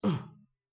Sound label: Voc Male Land